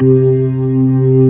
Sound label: Low Slow D#3, B3; SoundFont - The Developer's Choice - Volume 1